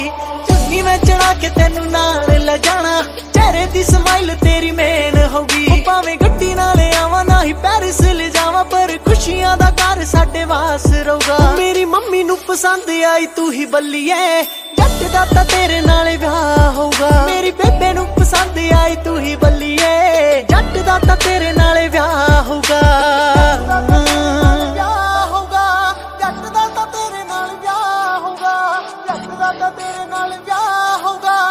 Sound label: Punjabi Song Ringtone